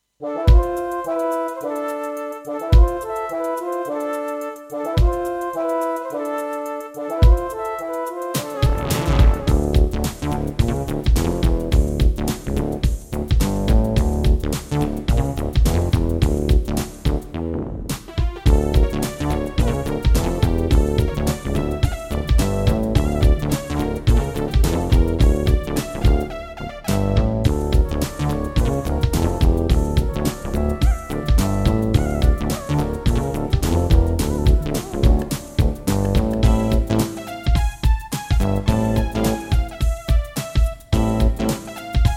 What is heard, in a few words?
Egypt, Roland SD-50 GM2 MODE; Lost Vikings